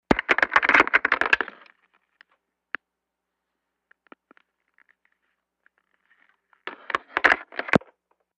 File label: Telephone fumbled in-line pick - up, handling noise, hang - up; Futz Movement